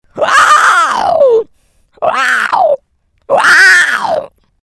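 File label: vampire sound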